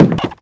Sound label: foot wood creak; footsteps; Call of Duty Modern Warfare